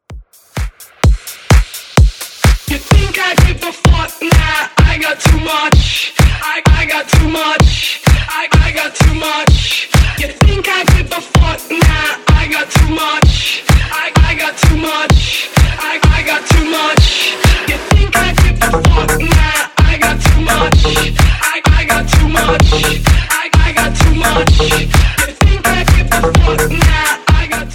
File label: EDM Redrum 128bpm